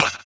Fx Vox; Equipped Music; Loungin House

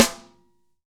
SNARES DRY; Real Mega Drums

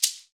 Ahfuche; Club-50 - Foundations Roland; Ahfuches

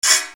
Percussion, EuroDance

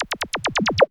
NOISE; AMG - Vince Clarke Lucky Bastard

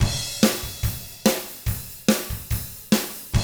ROCK, Double Platinum Drums

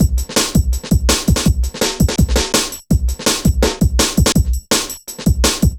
Programmed Stepz, Jungle Drum Loops

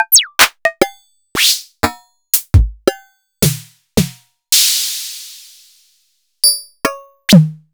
Sony DPS-F7 Kit

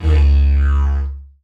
Didgeridoo Kit Samples

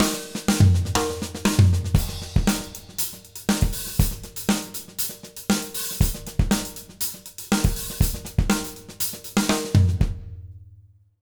120 JROCK, Drumloops; Jazz Latin; USB Soundscan vol.08; AKAI

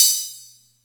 Music Percussion Computer HH open, Drum Machines; Atlantex MPC-1

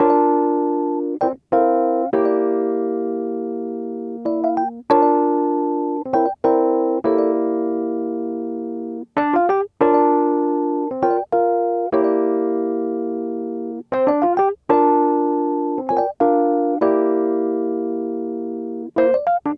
Smooth Groove Rhodes, 70s Analog Funk

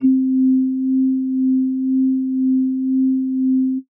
Lite Organ C3, Old Skool House

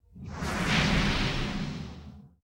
MOV, SWISHSWO; Total Control - Action Cutz

